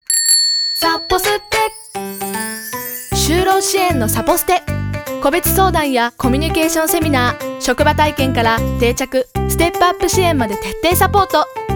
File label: bgm